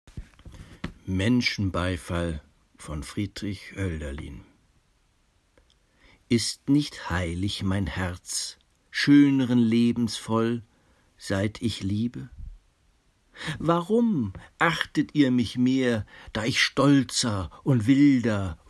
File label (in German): Menschenbeifall